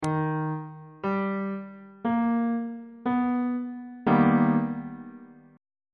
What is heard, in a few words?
b augmented major 7th 1st inversion on bass clef